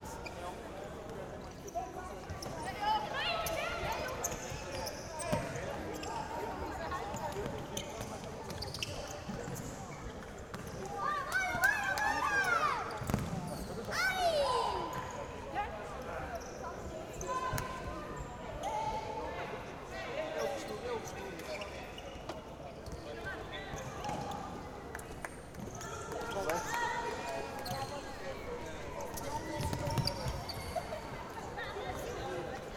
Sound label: Feminine League Match, Futsal Match Ambience